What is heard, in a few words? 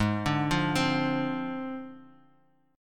G sharp 6th Flat 5th G sharp; arpeggiated; JGuitar